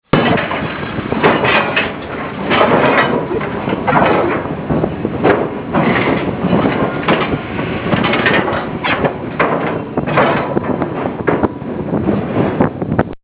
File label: Cachoeira Bridge